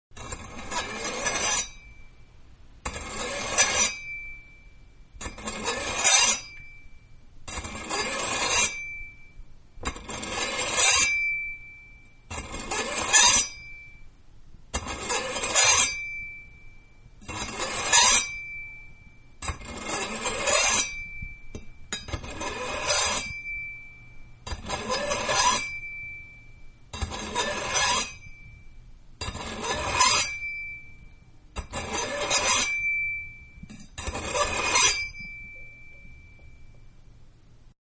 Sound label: Knife-Being Sharpened-Big; Kitchen (Sound Effects:Household)